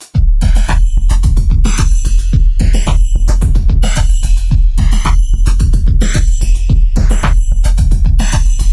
bassline drumloop; bassline project

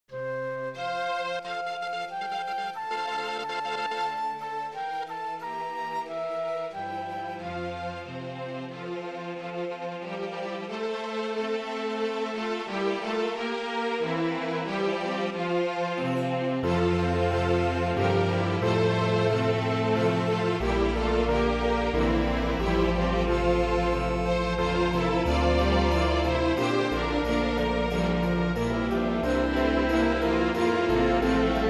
piano jingle bell

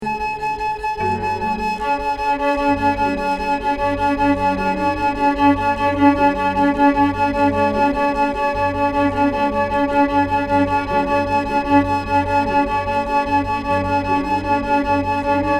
violin

